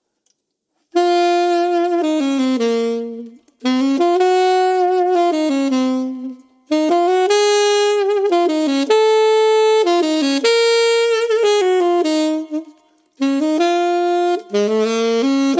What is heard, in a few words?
Alto Sax